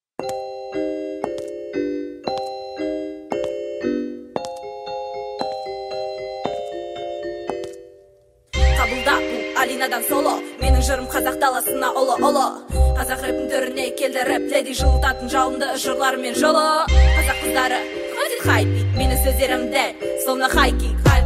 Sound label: solo